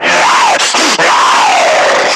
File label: Screamer Idle; Warframe; Lasrian